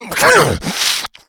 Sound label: rage, infected, male; npc, action